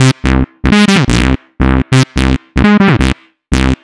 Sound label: Electro House, Synths; Sonic Foundry (Sony Creative Software); Underground UK House & Electro